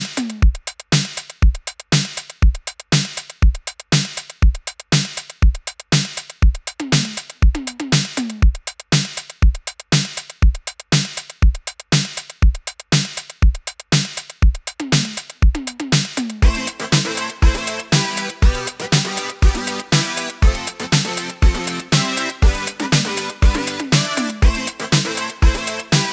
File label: Synthwave